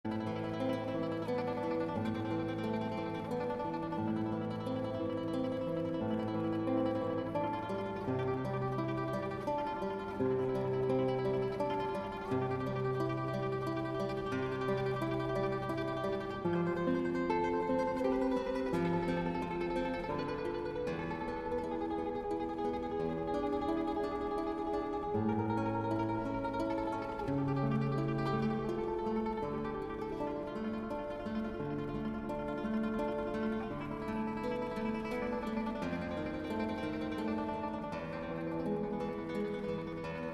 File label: Tremolo, guitar